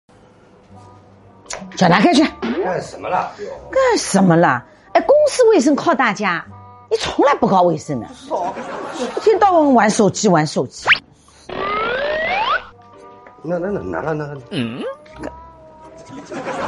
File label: laugh; breadradio